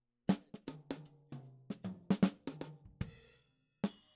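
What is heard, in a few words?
Snare top, Ragtime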